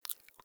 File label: foley mouth move; Warframe; Catbrow